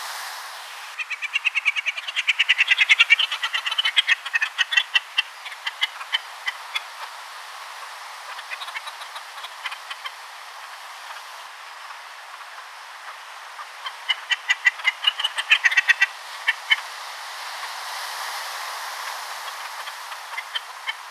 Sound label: Inca tern